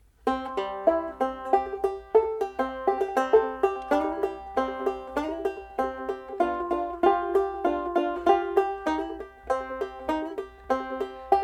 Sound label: nylon